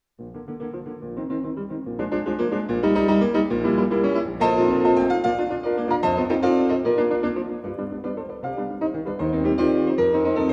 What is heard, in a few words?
Songs without Words for piano in B flat major; Book 5, Op. 62: No. 2; Mendelssohn